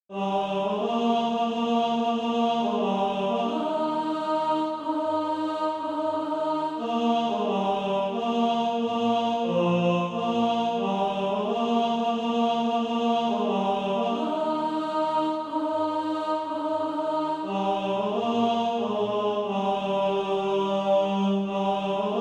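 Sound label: tenor, Practice Music; A Cry from Macedonia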